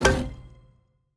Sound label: explode panther